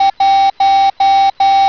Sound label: morse code